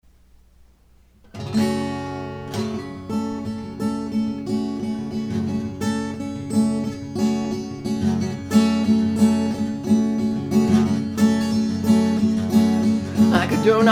Americana

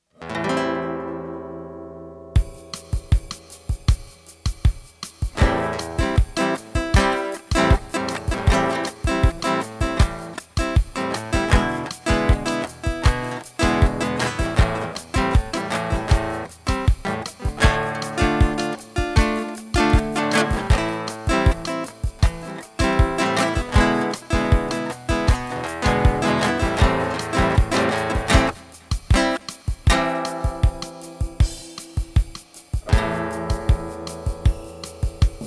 Guitar

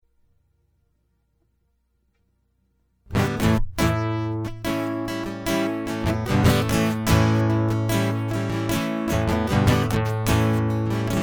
Guitar Loop

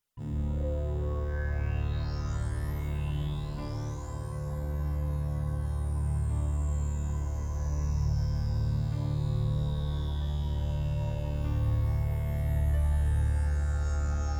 Underwater Piano Improvisation; hyper terrestrial; Incomprehensible

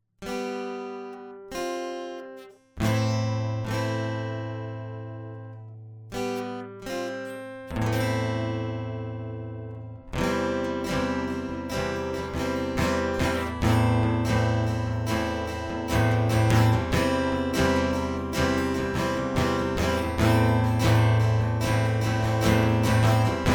Maria Guitar Solo

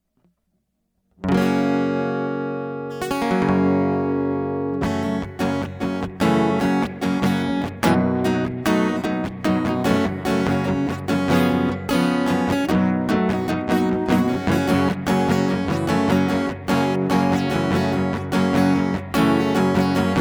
Instrumental; hyper terrestrial

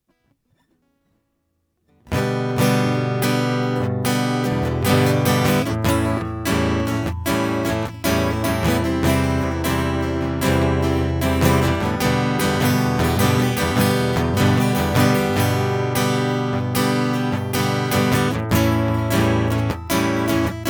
Gone Wild Guitar; hyper terrestrial

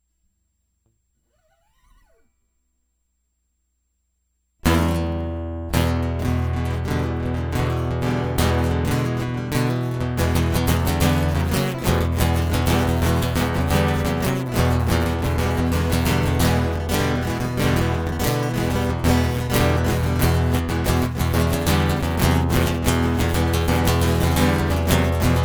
Guitar Prelude

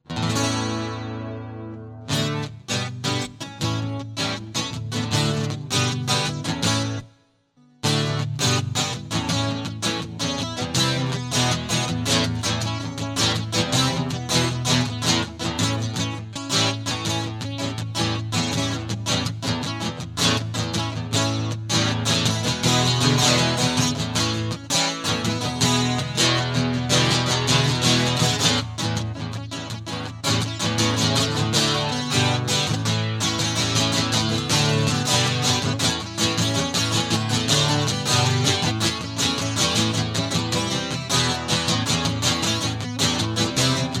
Guitar Prelude

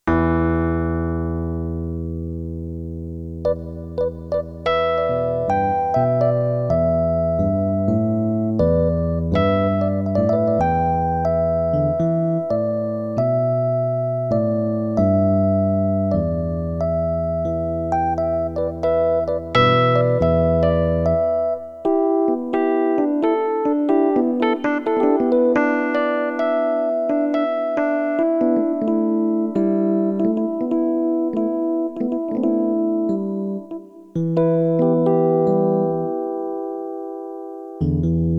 E Minor Musings First Movement

